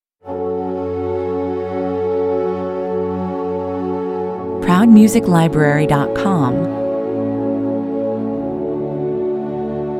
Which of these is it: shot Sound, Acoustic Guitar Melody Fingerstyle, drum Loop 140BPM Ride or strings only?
strings only